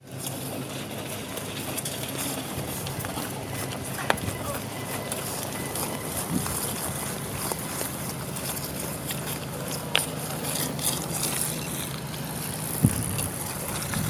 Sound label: cyclists on towpath